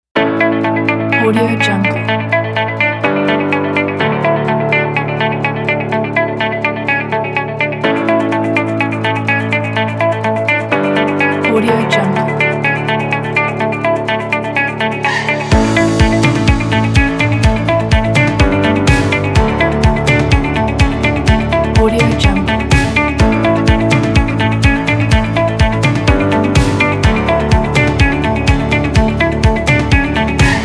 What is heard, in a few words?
corporate motivational; cakesound